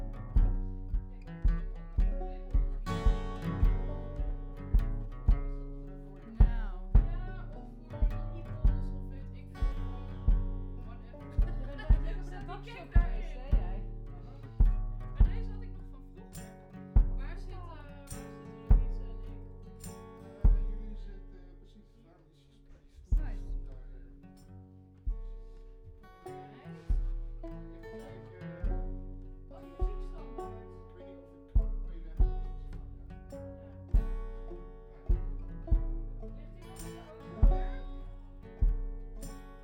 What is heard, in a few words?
Chordz